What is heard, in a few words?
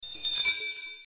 coin fly, fishing; casino